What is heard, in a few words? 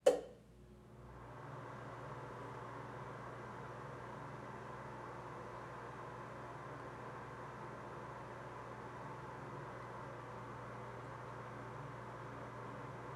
Exhaust Fan On Run Off; Blackmagic Fairlight